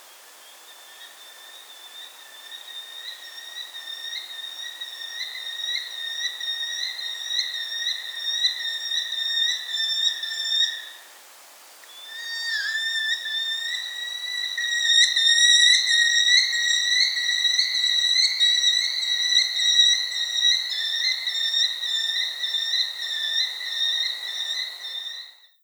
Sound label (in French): Faucon emerillon Falco columbarius